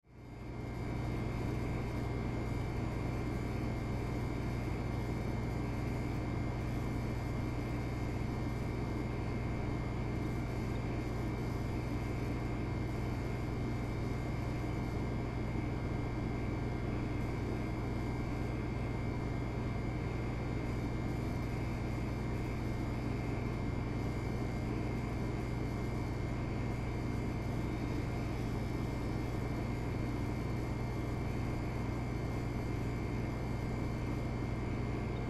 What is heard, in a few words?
Kitchen House Refrigerator Room Tone; Ambience; Blastwave